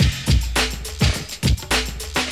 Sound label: tics, drum loops, funky